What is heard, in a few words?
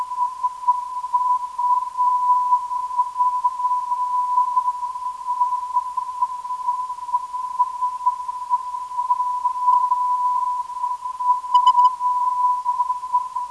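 UU 524kHz, non-directional beacon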